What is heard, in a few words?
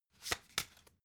playing cards shuffle single